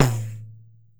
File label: PANDEIRO, Brazil Drum Perc; E-MU Producer Series Vol. 7 – Old World Instruments